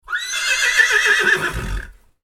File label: horse whinny ringtone